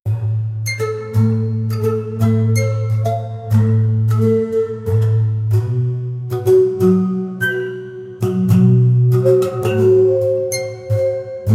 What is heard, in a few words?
create a high fidelity sound, taiko drums